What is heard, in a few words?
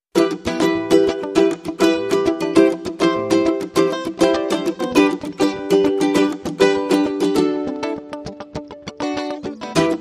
Ukulele and Guitar Mix